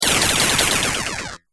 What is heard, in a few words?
Science Fiction Laser Rampant Shots, laser gun